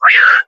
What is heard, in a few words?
whew; ooh ahh